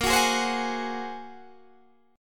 Bb 7th Suspended 2nd Sharp 5th Bb Guitar Open D, chord